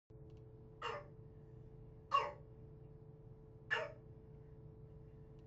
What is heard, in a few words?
brassy cough